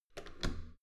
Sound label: metal door heavy handle down soft